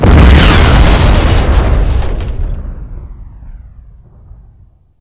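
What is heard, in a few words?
gunship explosion; hl2; combine gunship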